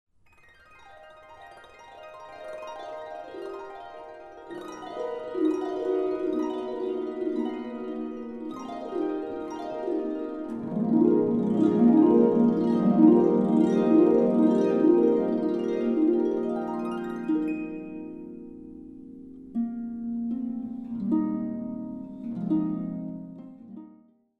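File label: Let us break bread together, harp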